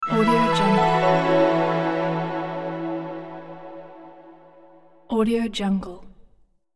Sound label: subtle logo reveal